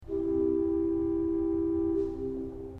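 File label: bordon 8 C, organ; srednja vas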